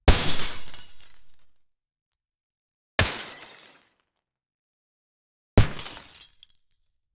impact glass break

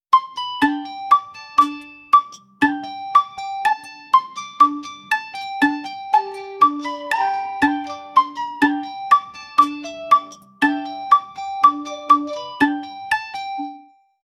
andean folk ambient instr; ambient music

